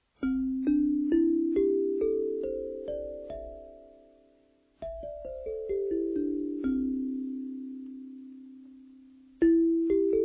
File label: Mama Stonaphone mono